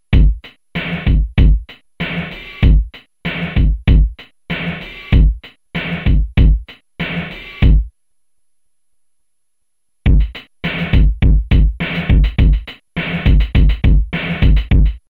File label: Lo Fi Beats